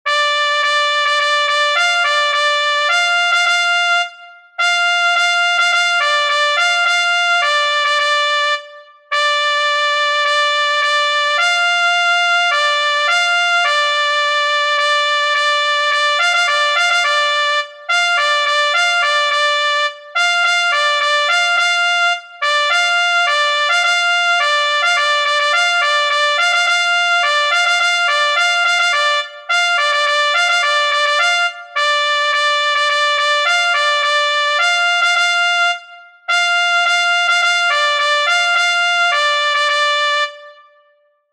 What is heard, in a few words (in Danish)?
fanfare parforce; kreds 1